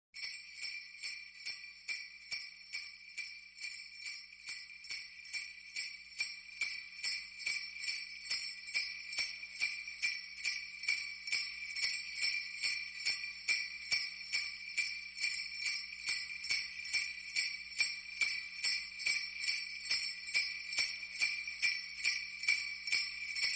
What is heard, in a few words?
Sleigh Bells SFX